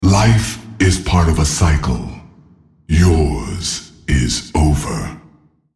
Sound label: attack; Nasus; Character Voices - English